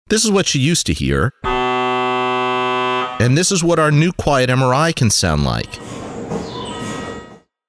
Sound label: Quiet MRI Sound